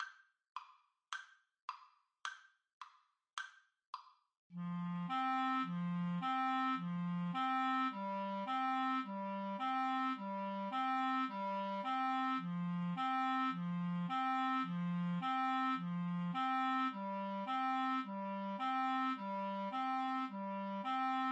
mexican hat dance Clarinet Duet

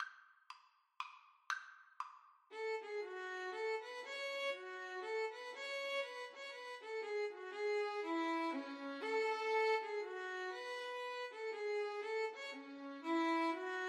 violin duet

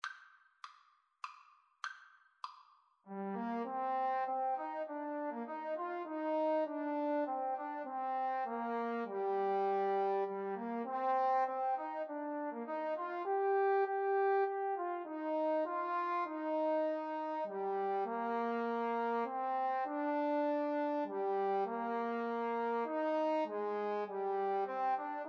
jupiter, Trombone Duet

